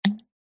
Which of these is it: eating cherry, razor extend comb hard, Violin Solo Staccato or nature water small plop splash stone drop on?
nature water small plop splash stone drop on